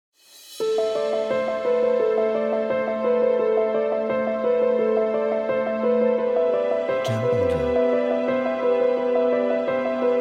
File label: Cinematic Piano